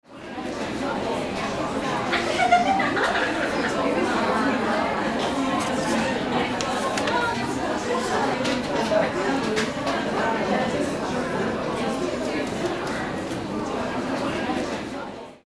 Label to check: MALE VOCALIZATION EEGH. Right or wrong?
Wrong